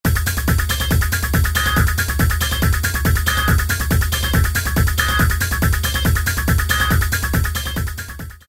Minimal

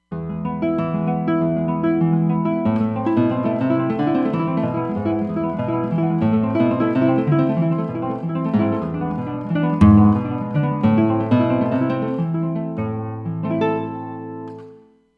El Toro Gtr, Roland Fantom XR

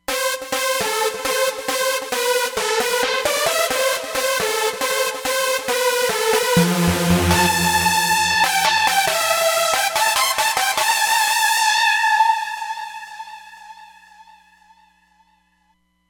Fat Eurolead, Roland Fantom XR

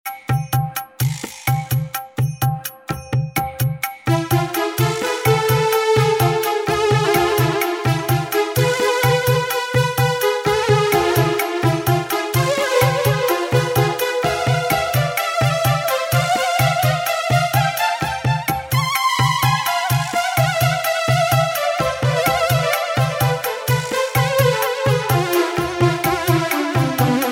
Bright Strings

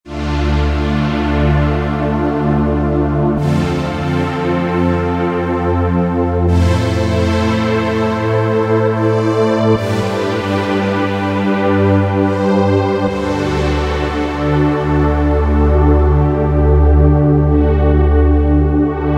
Ocean Of Saws, Roland V-Synth; Factory Patches

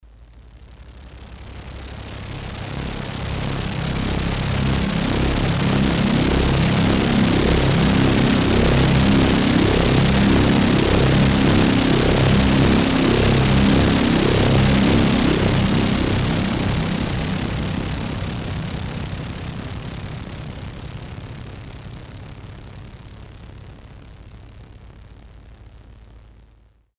Chopper, Korg DS-8; Factory Patches

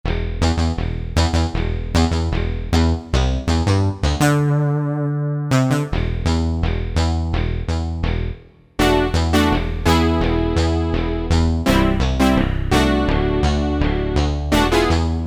Stabi Clavi, Korg MSC-3S Drums

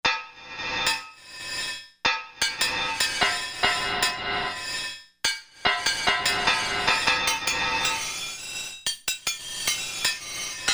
Clang, Korg MSC-2S Synth; Synth 1

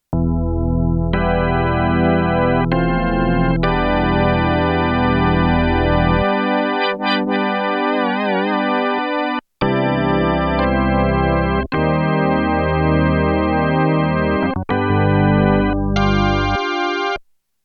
ROTARY EFFECT, Korg DW-8000; Factory Patches

